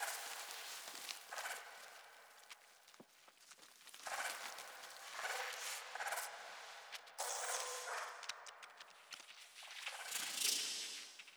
deepnest atmos loop; Hollow Knight

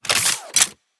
Weapon Reload

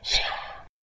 Through; I Am Weapon: Revival

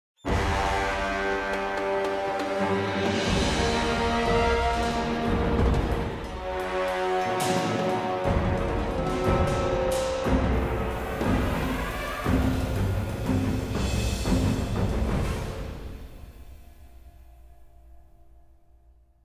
Combat Intro; Stone Golem

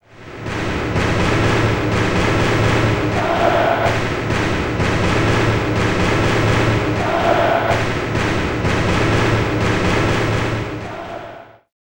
Chants, Europe

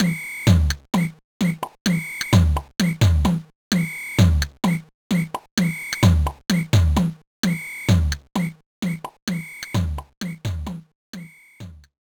BGM; card e reader 2001 gba

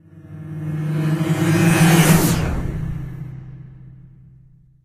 Build up

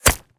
Bullet Impact Concrete Brick

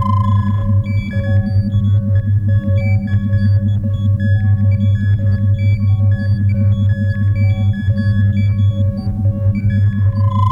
Alien Spaceship; Nicktoons Nitro; arcade

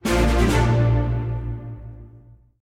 JINGLE QUEST RECEIVED; aster tatariqus android ios mobile gamerip 2023

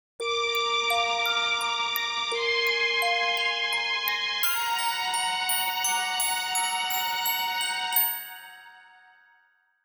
Endjingle

